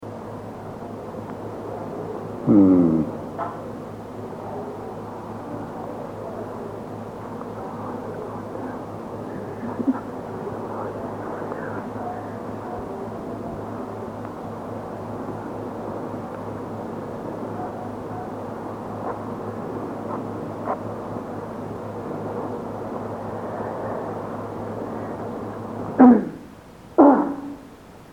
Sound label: giggle